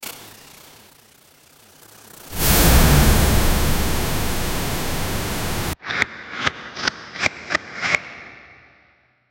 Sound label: fight effects